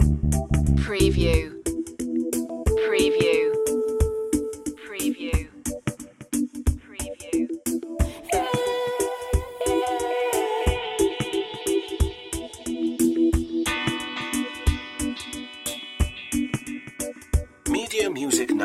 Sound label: dub loop